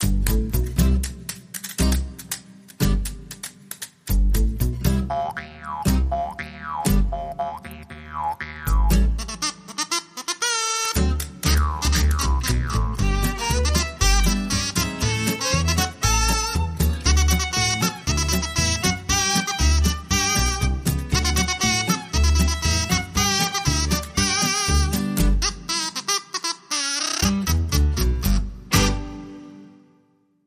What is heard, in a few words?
Jug Band Jamboree